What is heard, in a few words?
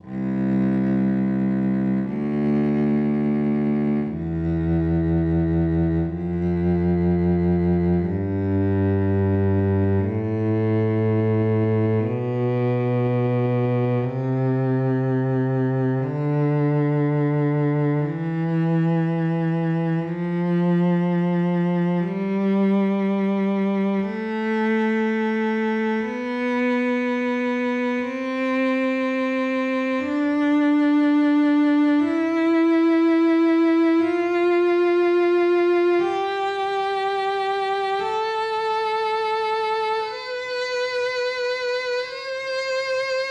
Test Cello Tone